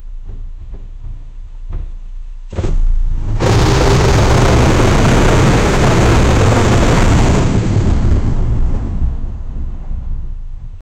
stomp mechanical roar, creature

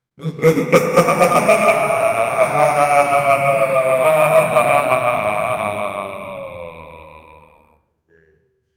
evil laugh; itachi uchiha

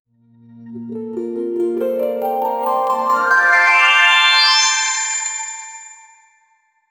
a whimsical but beautiful, bells

